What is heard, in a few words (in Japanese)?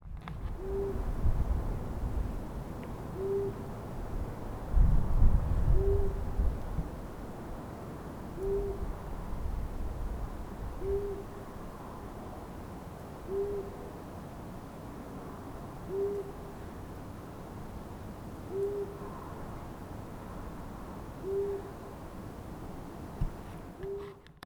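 トラフズク さえずり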